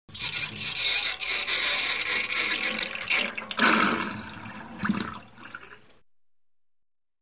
Water drain in the sink

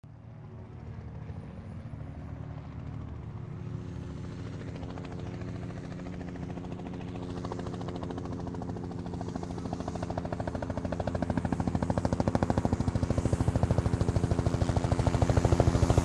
Helicopter approaches, lands and leaves

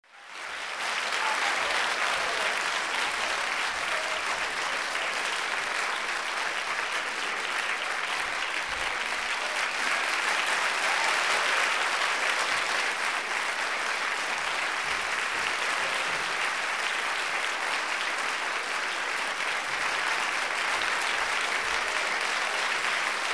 Ovation in the theater; standing ovation

